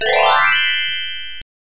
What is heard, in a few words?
trees